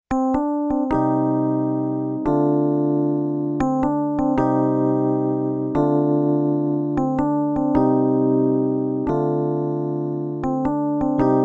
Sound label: piano pan linear; tellun